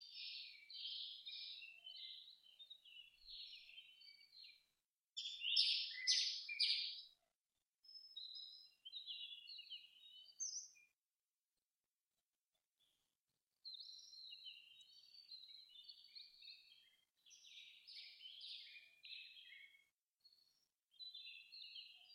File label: sunny clear day, ambient